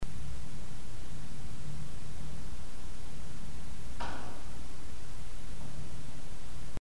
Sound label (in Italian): colpo interno